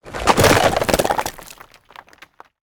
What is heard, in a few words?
Spider Trap Plant; Lurker; Evolve; Gorgon